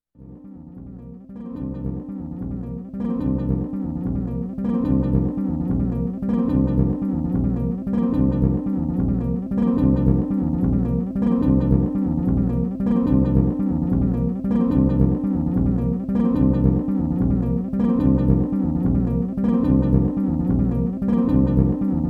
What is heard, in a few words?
relentless bass